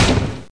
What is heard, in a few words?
hit wood